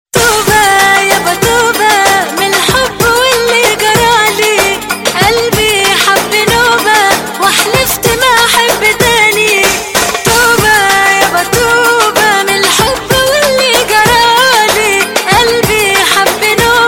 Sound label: egyptian song